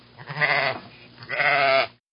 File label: sheep